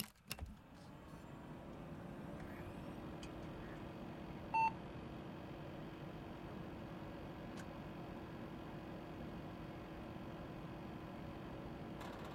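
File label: sounds of computer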